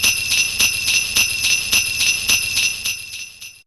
Jing Bell A4, Fairlight CMI IIe Bells

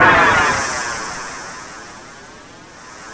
EXPLODE

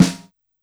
SD Jazz L, Yamaha PSR-E323, Jazz Kit

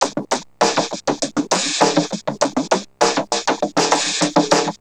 Vocobeat, Industrial Beats; Sonic Foundry (Sony Creative Software)

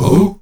AHOO G#3A, Heart of Africa, STAC.OO-V; Spectrasonics

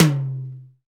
TOM TOM, Real

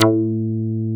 REZO BASS; Masterbits; Dance Trax

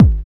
BD, BD Dance; Sound & Vision; Gigapack I CD 1

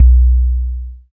BAS FM DX-7

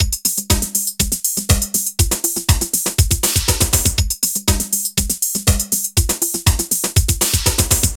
Drum Loops

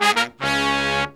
120BPM, Horns

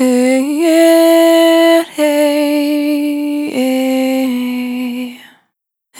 Single Voice Melodic Loops, Vocal, Dry

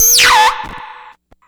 Arp Squelch, SFX, One Shots; Sonic Foundry (Sony Creative Software); Electronic Point Blank

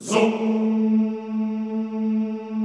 ZOOMMM A2, Classical Choir; Best Service; Extended Classical Choir

